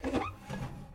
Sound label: Open Safe; Game rip; MiSide